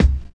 punchy kick drum c key, Royality free kick drum sound tuned to the C note. Loudest frequency: 282Hz